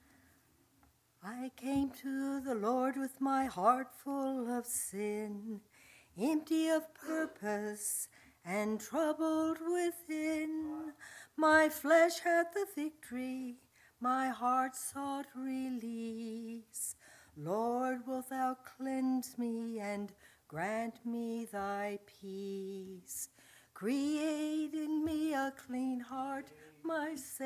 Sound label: singing